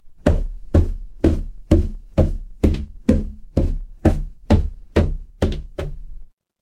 footsteps stairs

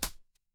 impact slam

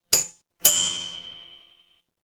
office elevator

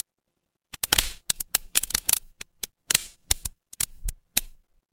fire electric crackle

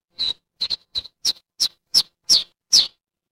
animal bird

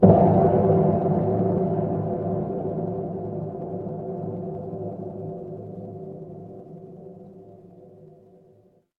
horror ambience dark

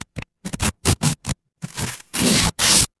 paper fabric tear

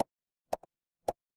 ui pop

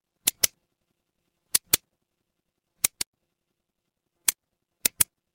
office pen

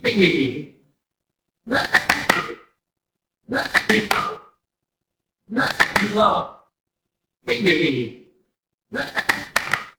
crowd applause

